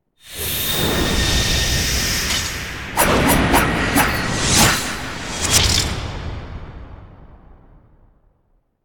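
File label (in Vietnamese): Âm thanh chuyển động nhanh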